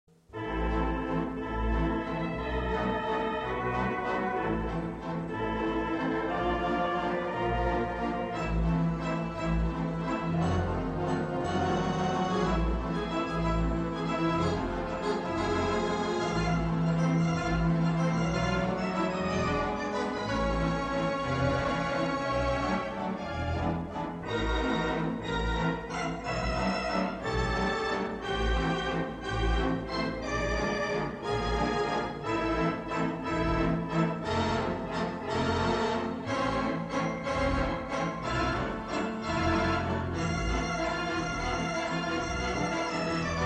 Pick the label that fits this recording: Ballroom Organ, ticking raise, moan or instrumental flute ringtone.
Ballroom Organ